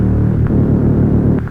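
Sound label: monotron delay - dm - 91, synth loops; instruments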